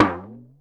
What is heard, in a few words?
Toms, Real